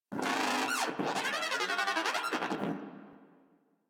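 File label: Moa Aggressive Vocal Death; Warframe; Pets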